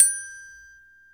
G4 glock; InVision Interactive; Lightware VOL-1; Instruments & Percussions; GLOCKENSPIEL

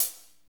HAT, Drumscapes Roland; Northstar; Fast Shuff

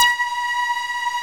SEQ, SYNTH; Keith Emerson Signature Series

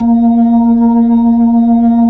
B-3 A#4, Jazz; Keyboards of The 60's and 70's

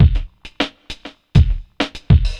drloop, drumloops, Vinyl Attack; Masterbits

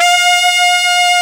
SAX, REED

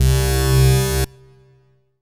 RAVE, RAVESYN

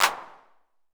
Clap, retro house samples, Drum Hits

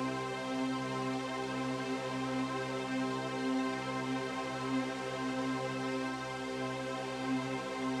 Drone; stereo toolkit samples; Non Tempo Loops

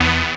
DR. LOOP, BUZZ; X-Static Goldmine 3

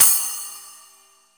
SPLASH; Best Service; Real Mega Drums VOL-1

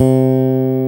5 STR P BS C4, PICK BASS

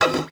GUITAR FX; Xsample VOL-2

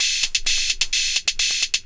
drt 130 electro, DRUMLOOPS, LOOP ELECTRO

